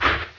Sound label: Brick Break; pokerogue; battle anims